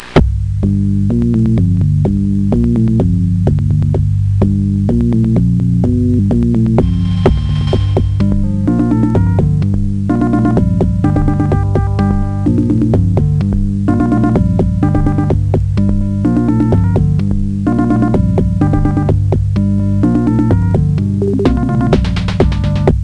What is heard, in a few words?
tune